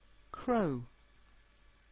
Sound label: crow